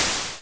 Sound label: light off; raylib; light my ritual